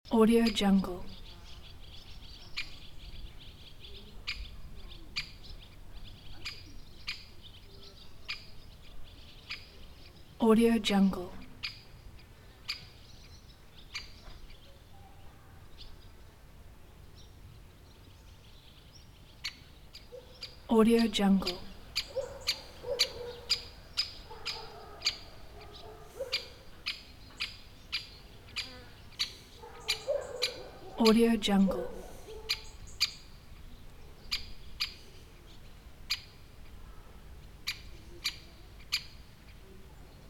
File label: alerting woodpecker at countryside loop; صدای دارکوب; nature sounds